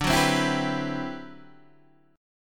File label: D 13th D, down, Guitar, DADGAD